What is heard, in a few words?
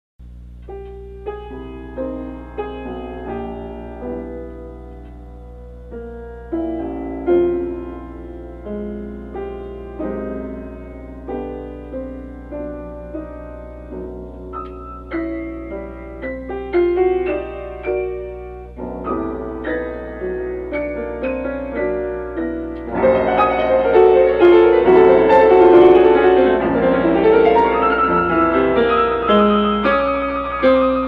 Piano Prelude